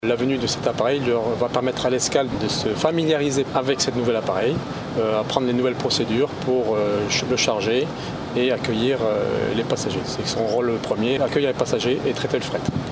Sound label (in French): AVION; armee de l'air; fenua